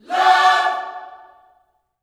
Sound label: LOVE CHORD, Vocal Gospel; Spectrasonics; Vocal Planet Vol.1 Gospel; akai